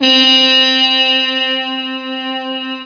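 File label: muted trump C6, muted trumpet; muted trump C4; Star Trek JR Audio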